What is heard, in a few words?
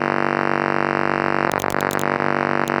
Mains Hum; Sonic Foundry (Sony Creative Software); Loop Noir